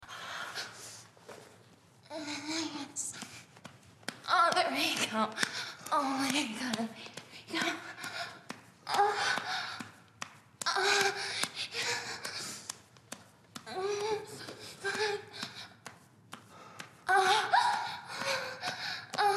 moan

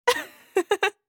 giggle; A succubus is born

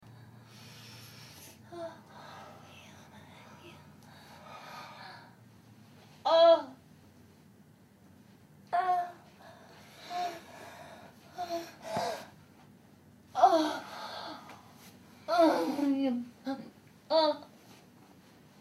moan

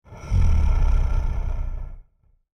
monster growl; A succubus is born

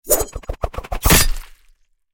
knife throw hit, rogue; A succubus is born; combat

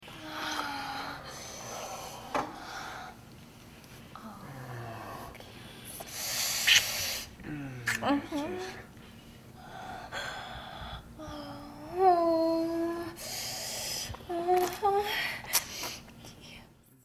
lick

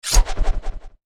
knife throw miss, rogue; A succubus is born; combat

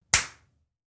slap ass; A succubus is born

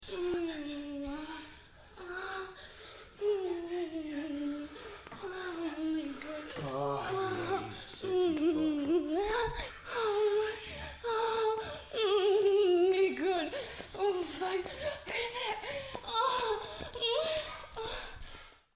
moan

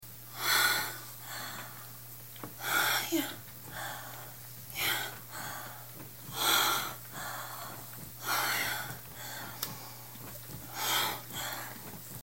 moan

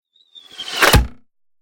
miss, combat, ranger; A succubus is born

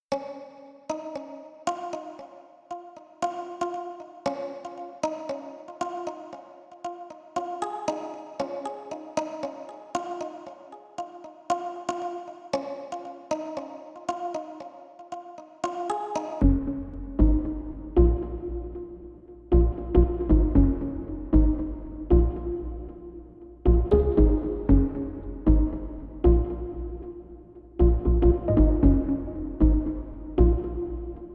club; Destroyer

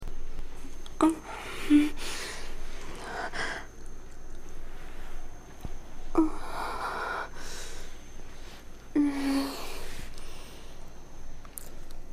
moan

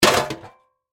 shield bash; A succubus is born; combat

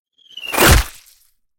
piercing shot, ranger; A succubus is born

